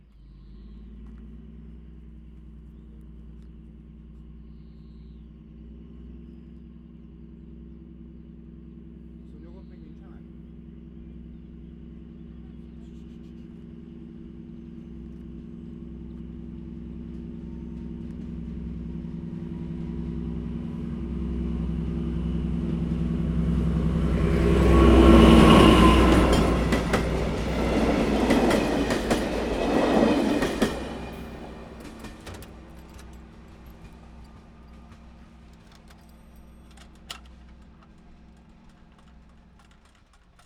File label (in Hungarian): vonat kihuz, sorompo feltekerese, vasut kezi tekeresu sorompo; vizvar; cardioid